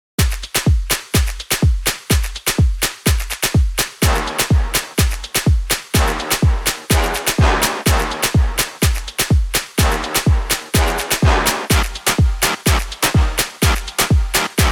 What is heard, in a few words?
Electronic Beat Thing